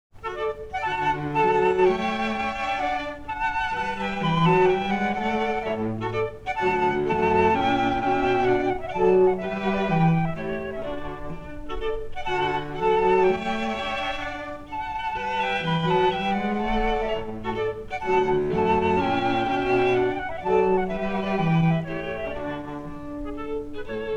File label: Flute Quartet; Mozart; Flute Quartet No4 k298